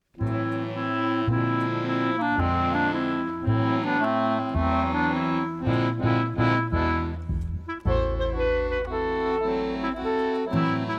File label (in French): playbacks; Barouf au musée